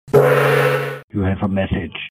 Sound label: Sms Funny